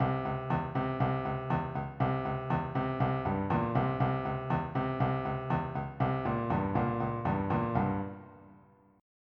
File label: fill